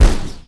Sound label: cannon; Tanks